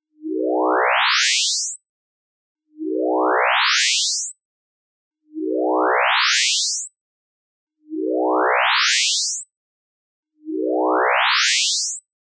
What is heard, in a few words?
chirp; chirp test